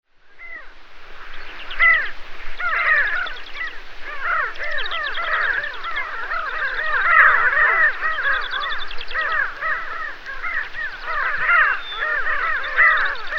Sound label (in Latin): Pterocles alchata